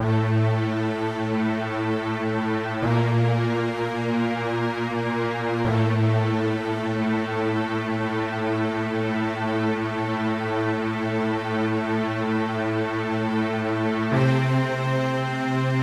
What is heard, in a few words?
Loop Nofade Nobase; King Lucas